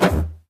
Camp Airbag Hit; Sfx - Camp Airbag Hit 3

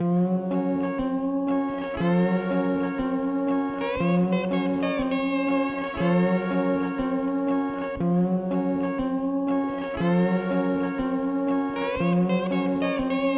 Dream Music; SpongeBob SquarePants Nighty Nightmare

